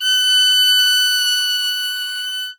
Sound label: Violin Loud F#5; CM Strings